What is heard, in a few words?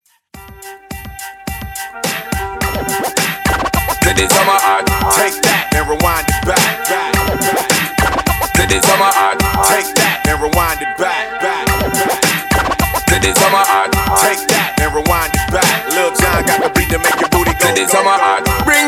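Oldschool Mix Show Scratch In Outro 106 BPM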